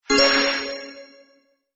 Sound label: bonus time; Nickelodeon - Back to School Search